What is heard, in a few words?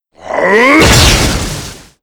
Paladin Skill